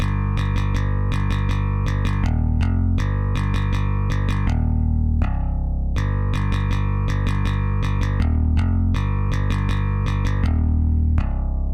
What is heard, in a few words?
Drums and Jingles Battle BASS C